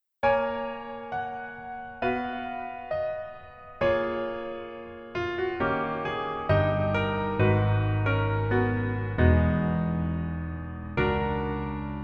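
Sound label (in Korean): Piano version 멜로디MR -1키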